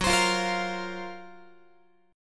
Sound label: Gb Major 7th Flat 5th Guitar